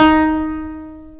BUFF STOP D#4, HARPSCH; AKAI S-Series CD-ROM Sound Library VOL-2

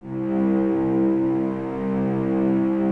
C 2, String Ensembles Cellos; Miroslav Vitous; 90 s Sample CDs

